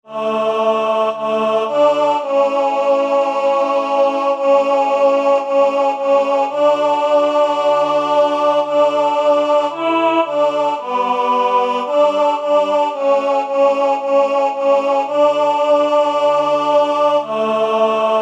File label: tenor; Heilige zeit